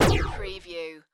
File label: Laser Gun Single Shot; Sound Effects Bible